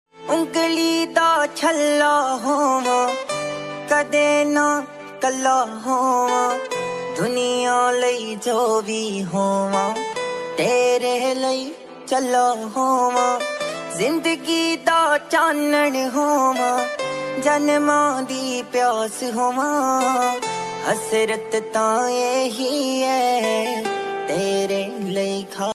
Punjabi Song Ringtone

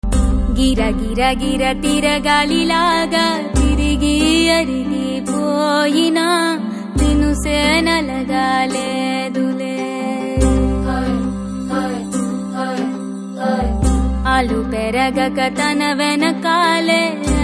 Slow Bit Telugu Ringtone

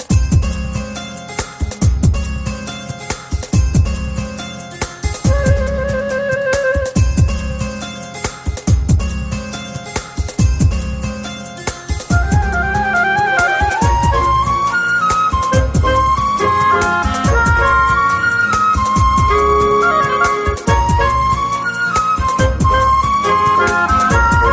Instrumental Tone

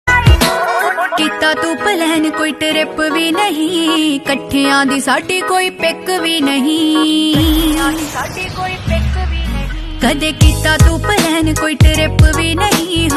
Punjabi Beats Ringtone

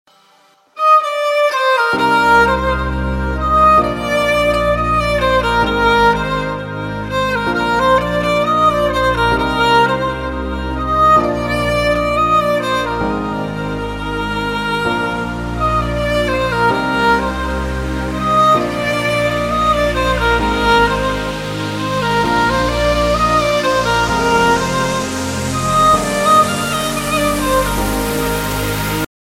Violin Beautiful Sound

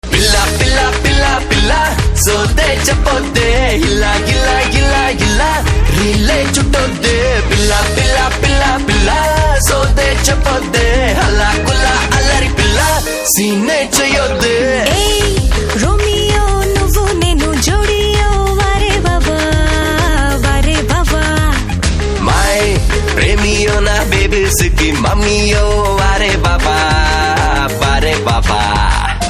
Telugu Ringtone

